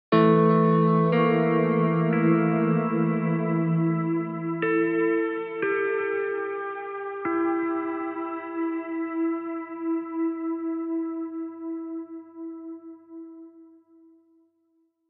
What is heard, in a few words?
guitar; Void Bastards; Krell